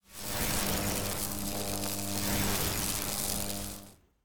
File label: Trap Energy Turret main